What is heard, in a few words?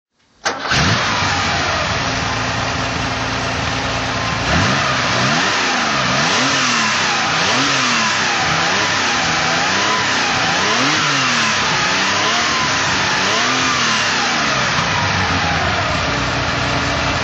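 porsche 911 carrera 2.7 RS throttle; Porsche 911 Carrera 2.7 RS Touring, 1973; Engine sound; V3D/ ClassicarImages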